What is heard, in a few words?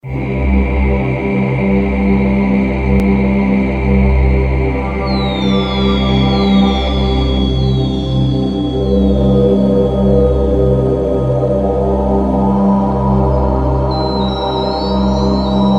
ambient soundscape